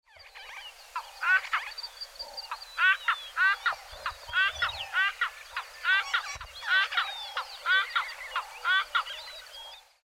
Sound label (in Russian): senegalskaja malaja drofa